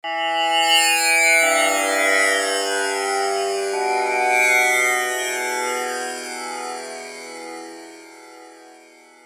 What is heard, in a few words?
sitar pad